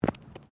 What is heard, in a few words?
FOOTSTEPS FOOTBALL BOOTS CONCRETE STEP; Footstep Foley; Human